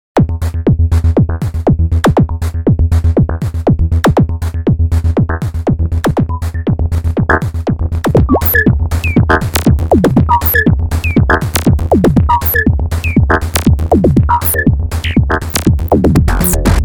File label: mopho sequences; mopho demos